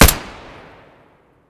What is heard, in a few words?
muzzle glock17 stereo